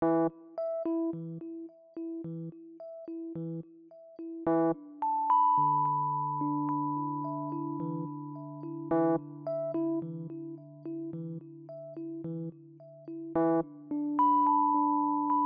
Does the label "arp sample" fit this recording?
Yes